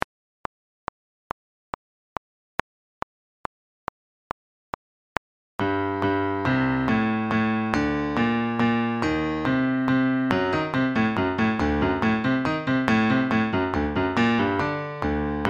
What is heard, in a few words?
backing track